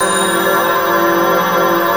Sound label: C5 bell; Northstar; The Wizard VOL-1; BELLTASY